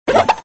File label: Nozzle Box Break; Super Mario 63